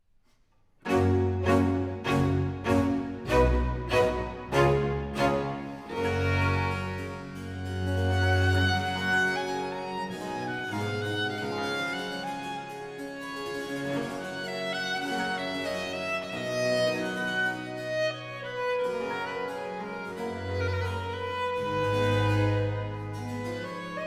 Violin Concerto, for violin, strings & continuo in E minor; Adagio; (doubtful), RV 274; Vivaldi; Concerti per Violino